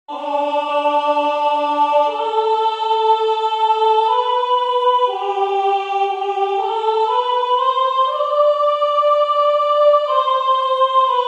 4 verset 7 Sop; Verset 4 5 6; Monteverdi; Vespro; Baroque

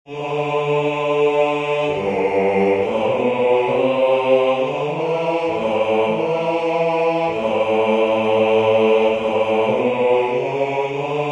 verset Basse; Verset 2 et 3; Monteverdi; Vespro; Baroque

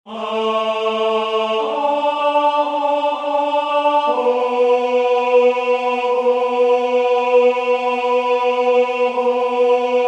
4 verset 7 Ten; Monteverdi; Vespro; Baroque

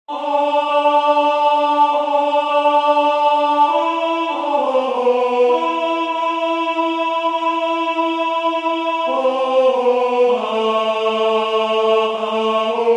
2 verset 23 Tenor; Monteverdi; Vespro; Baroque

